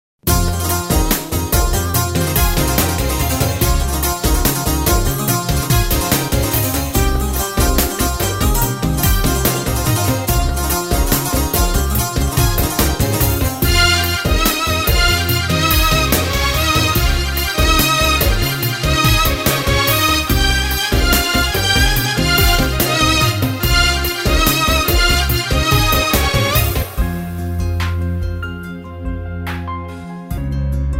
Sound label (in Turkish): slow arabesk